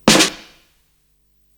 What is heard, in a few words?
snare roll, Psychedelic Drums